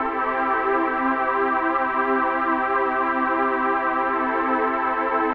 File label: Meadows, фоновый звук